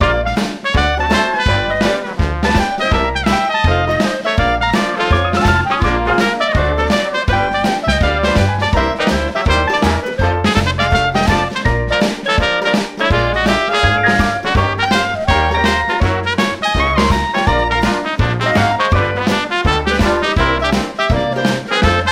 Hub Diner Loop, Music; Frog Music Streamed

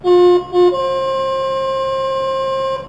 Next turn; Warlords; Amiga

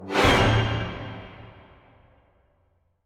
Raptor Scare; lego dimensions soundtrack

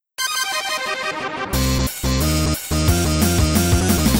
Battle Intro; Voidspire Tactics

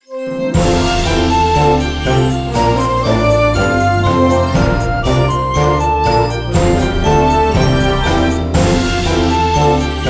Win; Yummy Yummy Cooking Jam

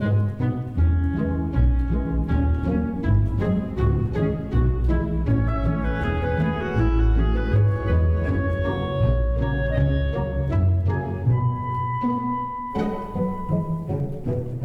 loop ball out music; Panda

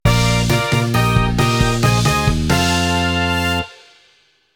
vr intro sting music; VR Karts

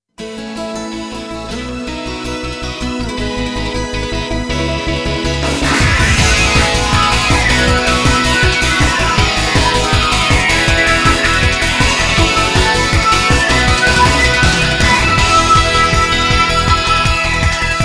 instrumental ver.-; shukufuku no campanella theme song

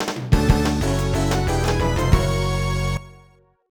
Unused Fanfare; Namco Classic Collection Vol. 2

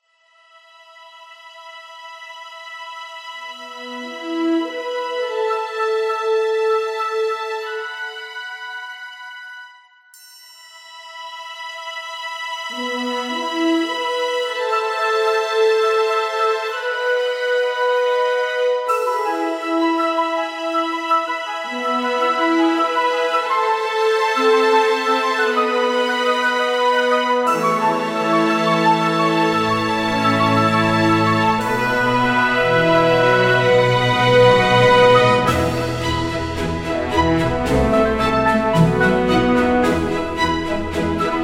BGM Character Select

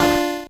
level squish D F; earthnight

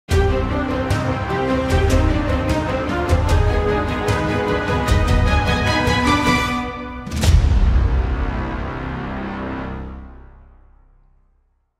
Victory Music; Town of Salem 2; Coven

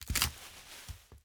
foliage break; teardown ps5 windows xbox series xs gamerip 2022

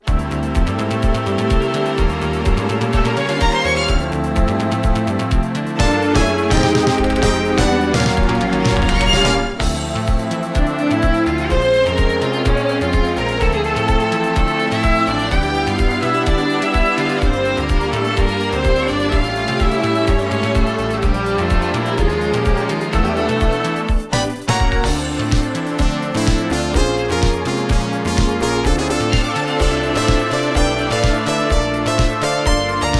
BGM slum; Town of Night

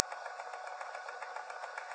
Tape Rewinding

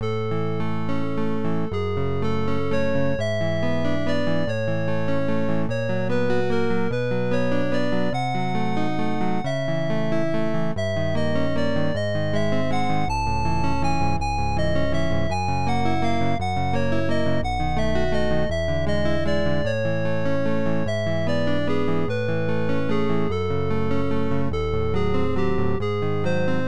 Romantic; the incredible machine; ibm pc at

